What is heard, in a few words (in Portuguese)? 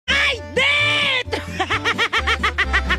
ai dento risada